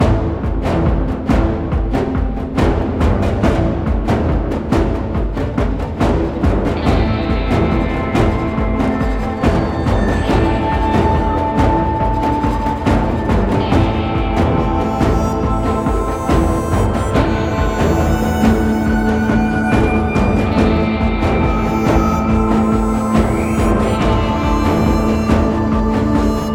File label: Western Medium Threat Loop; Fortnite